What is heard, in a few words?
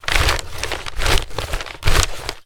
paper sheets many crumpled compress movement; foley; zapsplat